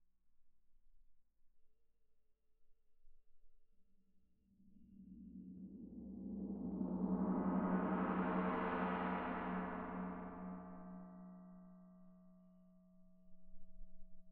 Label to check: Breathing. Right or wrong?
Wrong